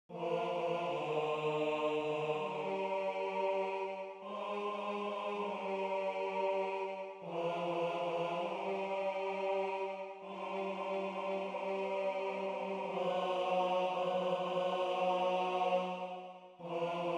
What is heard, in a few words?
Bass